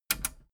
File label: metal button radio click enable fast heavy